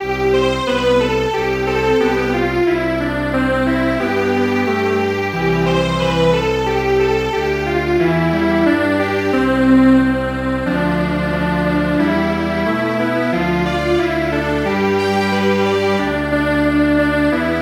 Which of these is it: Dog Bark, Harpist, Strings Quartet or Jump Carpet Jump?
Strings Quartet